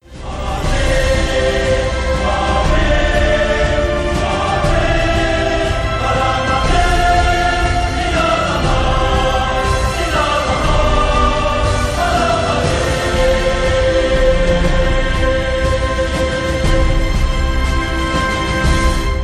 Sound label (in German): Torhymne; real madrid; rmcf